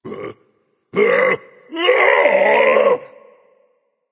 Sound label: fear, voice; Smite; ymir skin14